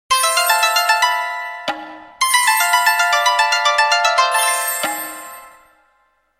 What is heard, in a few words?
music